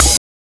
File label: Hihat; osu